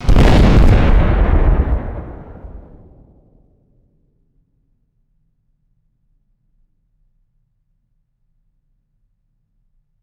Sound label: loud punchy gas explosion blast; explosions